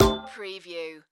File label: Multimedia Error